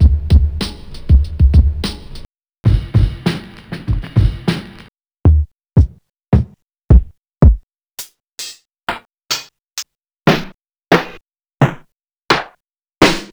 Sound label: Drum Kit Ensoniq EPS; Nuttkase